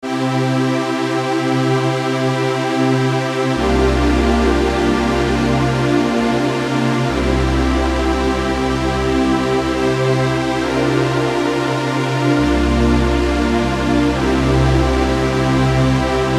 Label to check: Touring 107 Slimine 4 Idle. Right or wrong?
Wrong